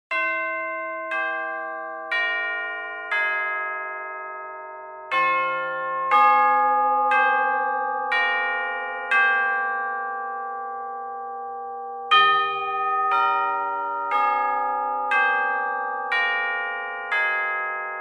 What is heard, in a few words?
Chimes Of Gloucester Cathedral